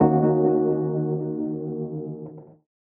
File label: Bbm Keys, One Shots; Keys & Synths; Touch Loops; BlissedOutBeats